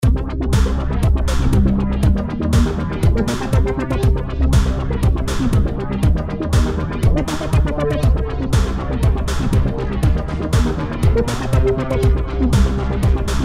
bass seq 2 simple

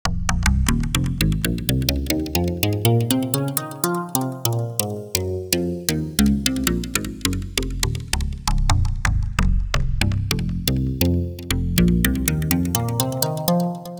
deep tripple x bass

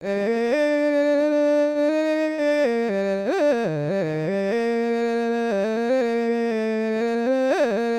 voc

